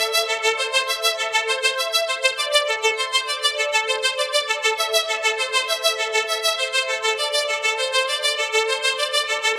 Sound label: A Seq Thin Arp; Zero-G; Future Wave